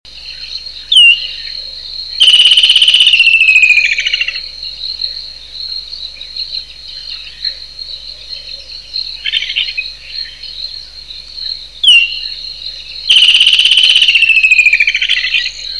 woodland kingfisher

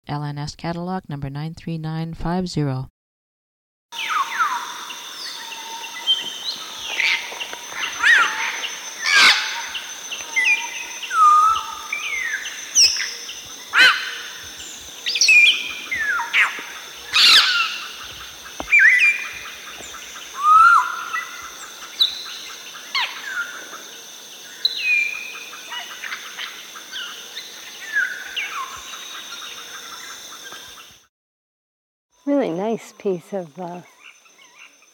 grey parrot

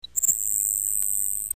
grasshopper sparrow

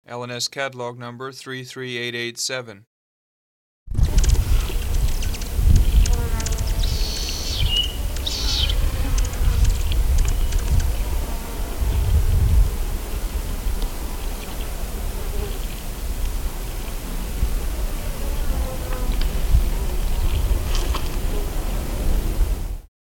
oasis hummingbird

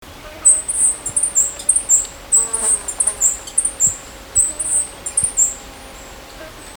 emerald chinned hummingbird

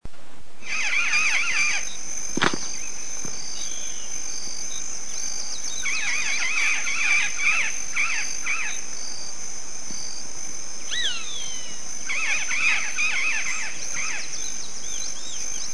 yellow faced parrot

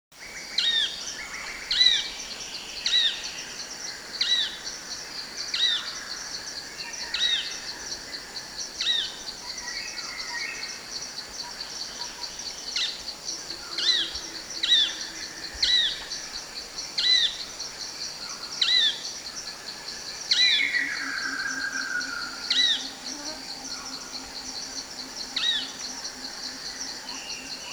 heart spotted woodpecker

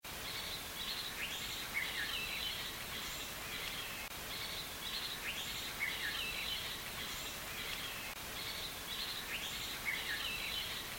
olive woodpecker

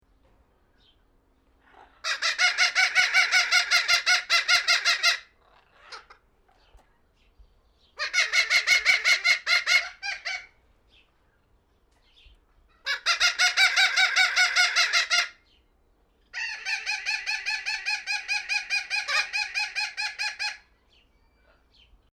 maroon fronted parrot

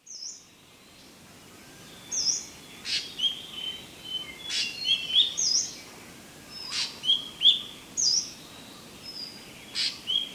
yellow breasted magpie

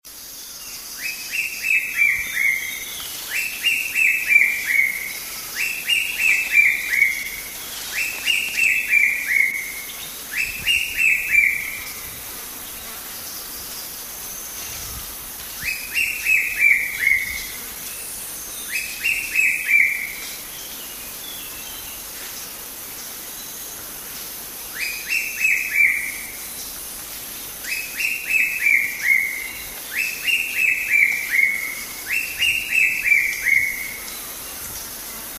barred long tailed cuckoo

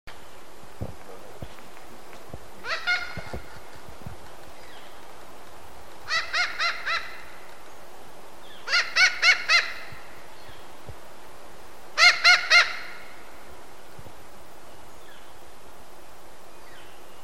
thick billed parrot

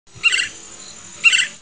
black cheeked woodpecker

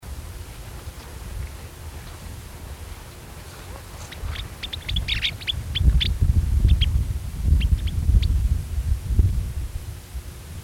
puna plover

